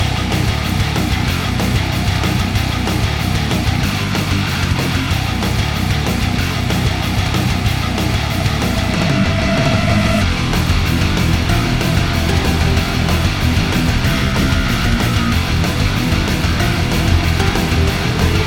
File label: BGM ramen